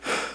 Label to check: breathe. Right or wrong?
Right